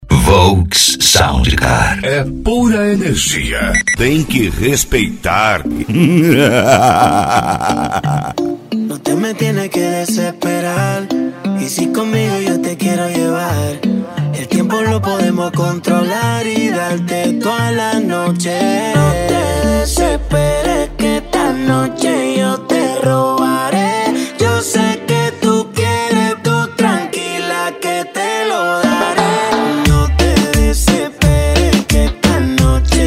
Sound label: sound car reggaeton; cd volks sound car